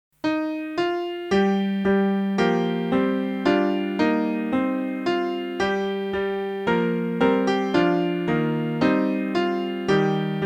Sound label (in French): tutti